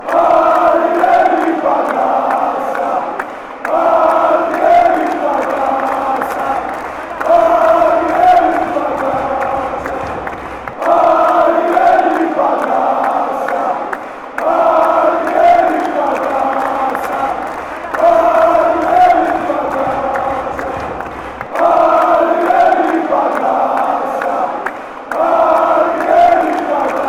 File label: chant, fanchants; olympiakos